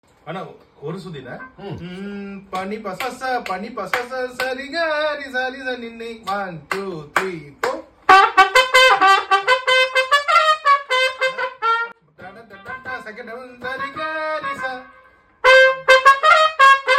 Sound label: trumpet bgm; sachandrasekar; narain; poonamkaur